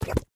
Mud Bouce; doodle jump 2; android

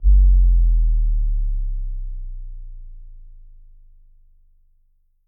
Bass Drop Sweetener; The Town; Intro Sequence

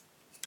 Item Collect

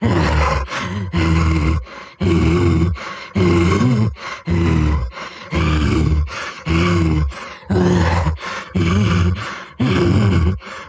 Chase Low; Devour

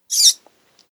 rat squeak; Devour

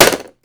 Minigame Attack

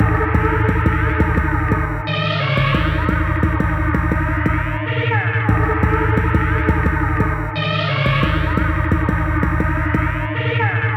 Bossfight; Impossible Draw; Corruption Music

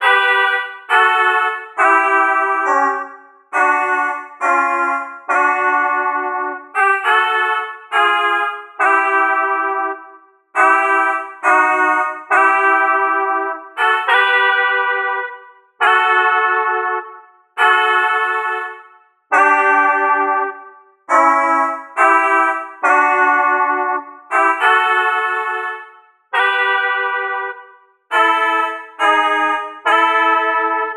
Underwater Fast; New Super Mario Bros. U Deluxe; Baby Yoshi Layer

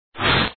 pump

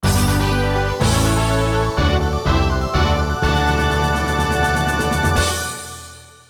Goal Fanfare; Snowbow Kids Plus

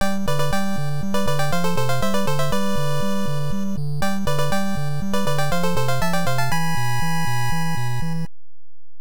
Congratulant Fanfare; Kai no Bouken The Quest of Ki; NES

